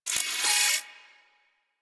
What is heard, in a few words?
Turret Harness Movement; Warframe; DE; Vehicles; RailJack; RailJackTurretHarness